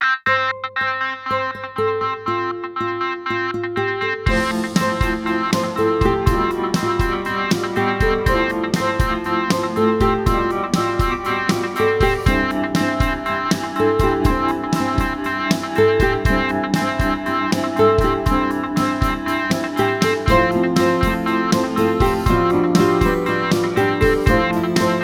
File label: (no cello)